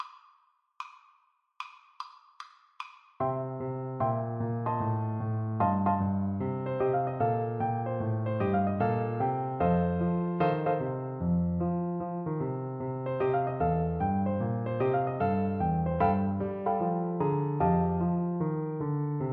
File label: shuffle Flute